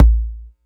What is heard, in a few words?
digital attacked, Kickbank; Ableton Foundation FreePacks